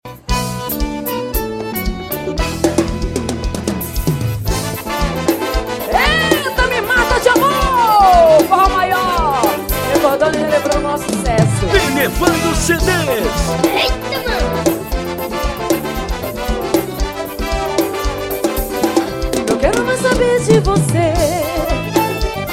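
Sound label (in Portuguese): forró ao vivo; em olivenca al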